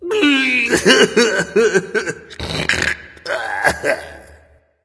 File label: boohoo; smite; hades